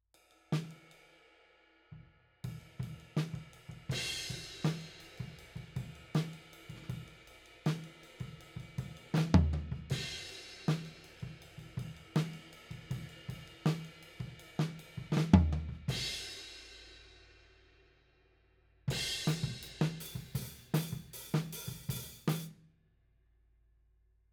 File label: Tom, 160 BPM